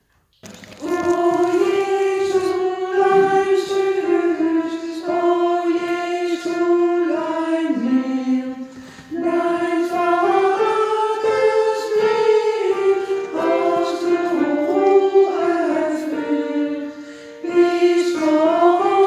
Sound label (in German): O Jesulein Suss Alt